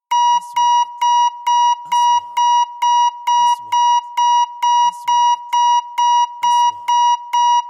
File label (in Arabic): نغمة تنبيه خطر; transitions